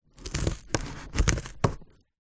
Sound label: office scissors cut cardboard